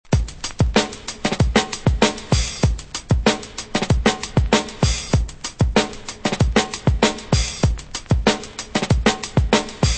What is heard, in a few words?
drum loops